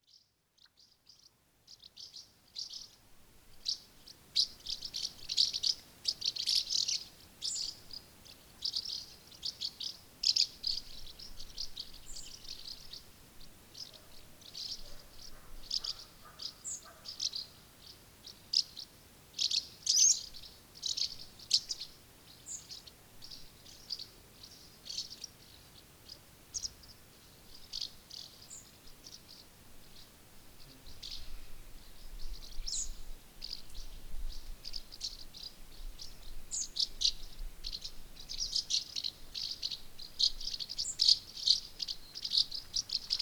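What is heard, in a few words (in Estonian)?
rääsuplaastapääsuke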